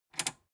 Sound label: metal button tiny click enable fast